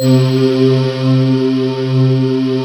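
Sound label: CHOIR BELL C3; USB Soundscan vol.28 - Choir Acoustic & Synth; AKAI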